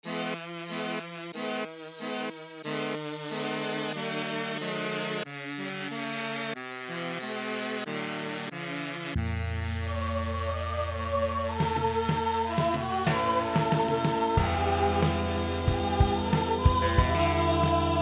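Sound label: melotron micro tenor sax violin mix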